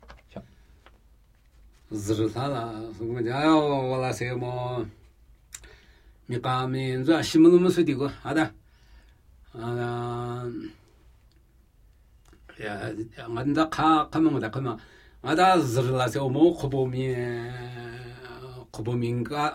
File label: Namuyi Tibetan Ritual Chanting; Ru lu tche su